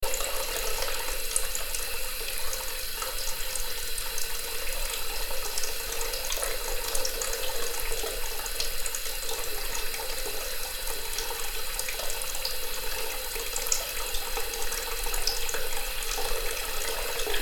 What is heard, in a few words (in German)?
Wasser